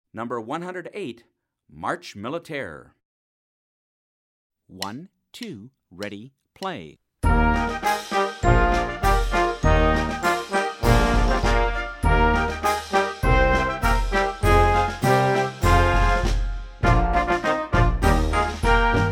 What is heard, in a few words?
march militaire, band